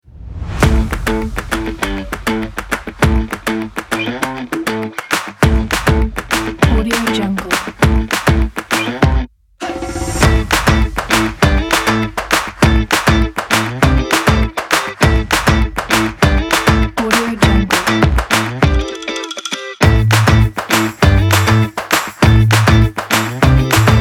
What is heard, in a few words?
happy upbeat kit